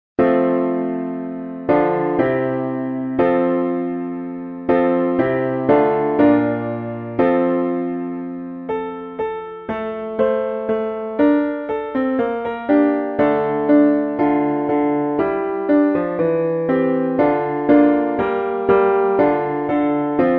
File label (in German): Klavier; If ye love me; Thomas Tallis